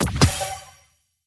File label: Item collect